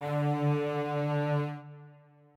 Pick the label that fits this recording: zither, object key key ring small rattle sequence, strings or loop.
strings